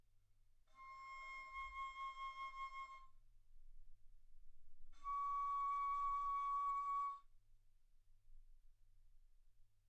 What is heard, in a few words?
Bass Flute ff C6 Db6